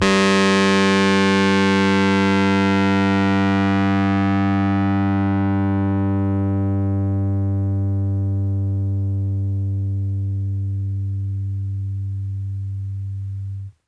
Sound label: RHODES FUZZ; PlatinumPhattCD2; E-MU Producer Series Vol. 8 – Platinum Phatt